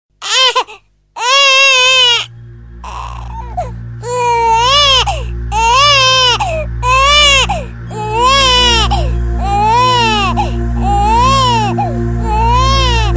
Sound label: Baby Cry